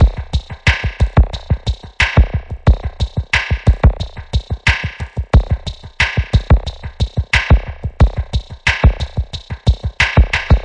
090 spring loaded breakbeat

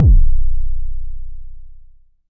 Jomox MBase, drum machine; Jomox MBase 01

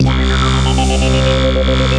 Synthesizer Middle C Notes, Lead

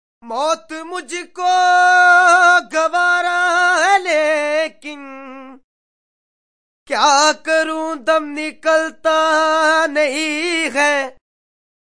deep india, Indian Singing

